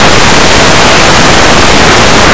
drawer; Blue Heat

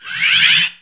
combo

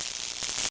ELEC

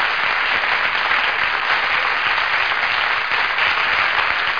CLAPPING

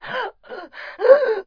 gasp; Daikatana; mikiko

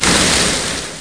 WATER; Waterboy

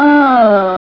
Die; Catacombs

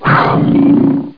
cat; CAT ICU

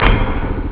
drums bass; OctaMED SoundStudio V1

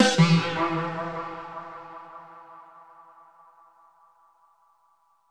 DRUM LOOP ambient 160

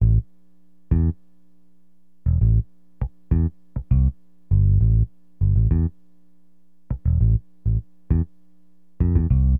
Bass, Acid Jazz Funk

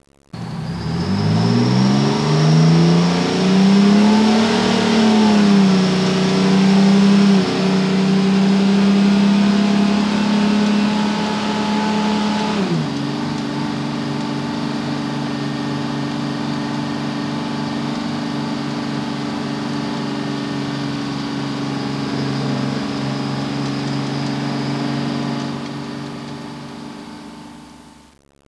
acclrate, fire; disaster

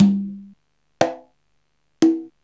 roll; MOBICLIC 95

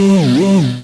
Damage; Refl(68040)

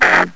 Orbital; ChaosVR

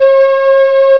metal nail loop; Prince of Persia 2 Demo

